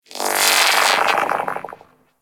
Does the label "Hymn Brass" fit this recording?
No